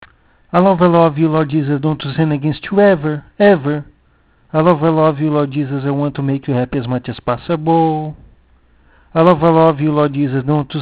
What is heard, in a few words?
just voice